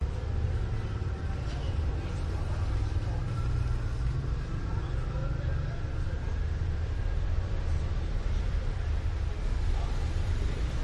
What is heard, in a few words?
athens side streets weekday evening; dimitrakopoulou; your free sounds